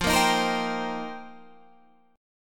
F 7th Suspended 2nd Suspended 4th, Guitar Standard; F7sus2sus4; strummed; JGuitar